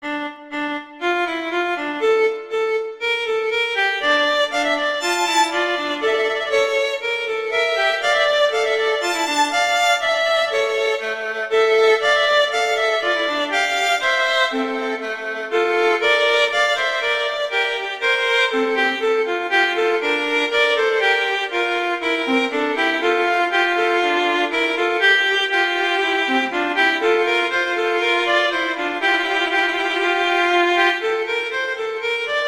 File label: Piacevole non largo; Telemann; Canonic; Sonata 4